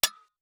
METAL SNARE MINI

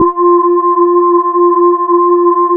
FLOATING F4; Drums In Your FACE!

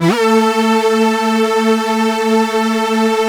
lead synth

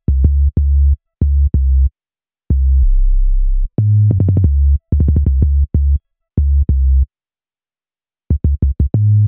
Bass, Hip-Hop

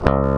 GTR ELGTR, Clean E.Gtr, Velo Chord; Roland LCDP02 Guitar and Bass

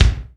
kicks

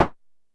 Drum X Clap; Pearl Drum-X

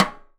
Side, Drums; Polyend; Simulacrum Sounds; 1 Shots; Acoustic and Electric

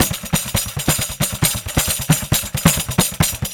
Pandeiro, 4-4 Drum Loops, Perc Loops; Drum Tools

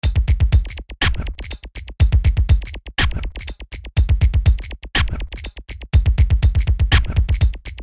Beat

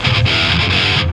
GTR

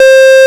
MONO POLY

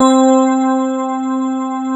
pad piano; East West; Global Sounds

